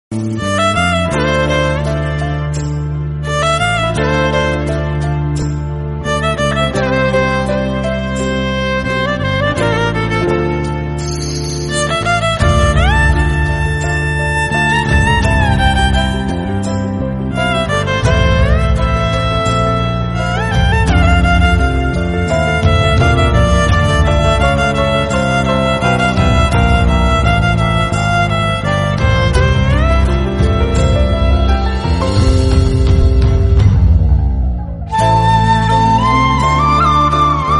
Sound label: instrumental bgm